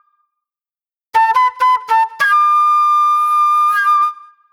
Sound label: Agro Fusion Flute; Sonic Foundry (Sony Creative Software); Manhattan Flutes; Garage Funk